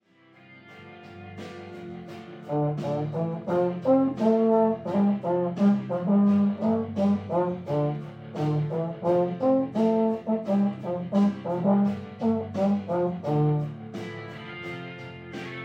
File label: Euphonium; Schulhaus Hanfländer